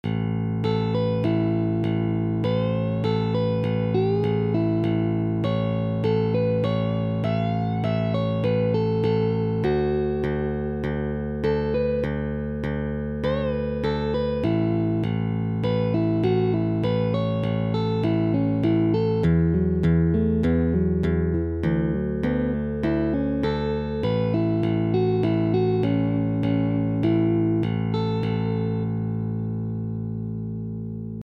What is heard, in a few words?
Easy Major Pentatonic Solo in A